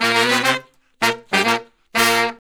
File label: Horn Section